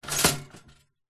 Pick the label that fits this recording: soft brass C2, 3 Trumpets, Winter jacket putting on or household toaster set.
household toaster set